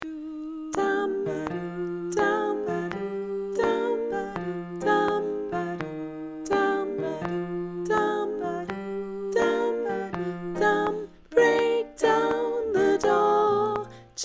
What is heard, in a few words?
Tenor please don't